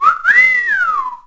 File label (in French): sifflet; Scenery; Decors